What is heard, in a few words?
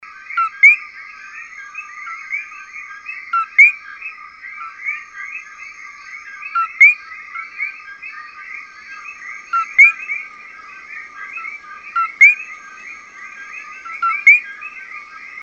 coqui; Ecoqui